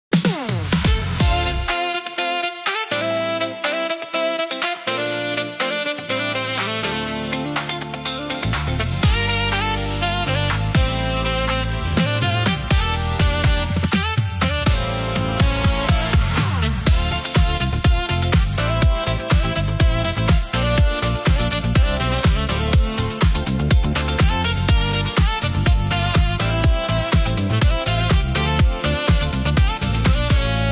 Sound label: Sax